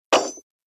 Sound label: Beartrap Floor; Granny Chapter Two